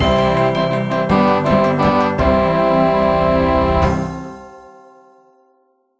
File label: complete